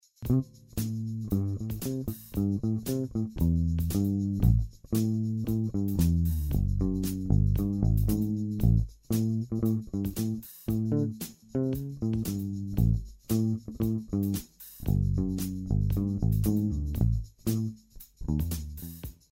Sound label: LP Triumph; gibson; bass; 1972 Les Paul Triumph